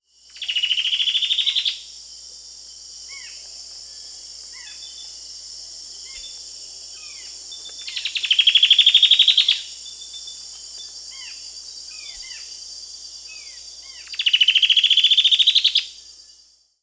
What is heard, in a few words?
Striped Woodcreeper (Xiphorhynchus obsoletus), Peru; S. America